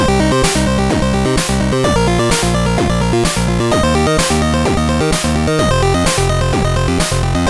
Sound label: Groovy 8bit Stride